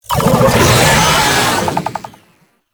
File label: Attack, Vocals; Warframe; Proto Infested; Babau